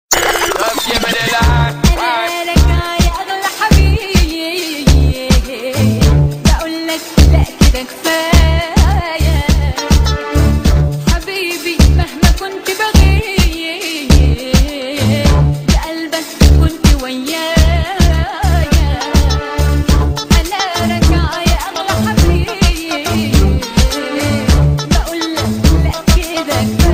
arabic tone, ringtone

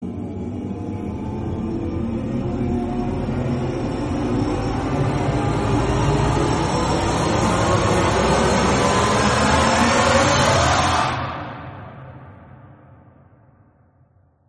sound suspense; Sound Suspense 2 Ringtone

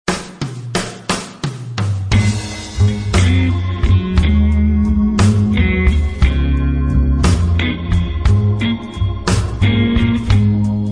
blues; Niles Blues Ringtone

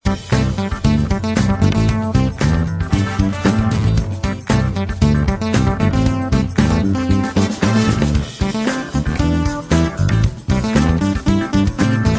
funky chunk; Funky Chuck Ringtone

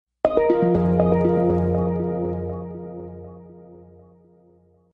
sms music

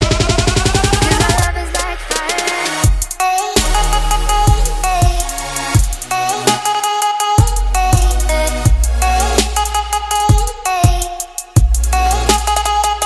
dubstep; Dubstep Ringtone

